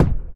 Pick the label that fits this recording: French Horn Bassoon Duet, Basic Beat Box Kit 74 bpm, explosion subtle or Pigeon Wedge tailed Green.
explosion subtle